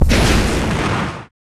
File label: atlas dash close; Titanfall 2